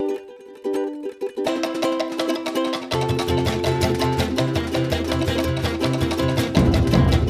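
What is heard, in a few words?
ocean world music cage forcefield intro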